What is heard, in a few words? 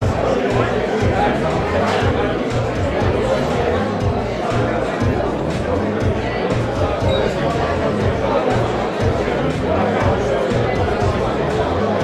ambience bar large busy dance music; sound effects one